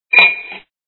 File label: ching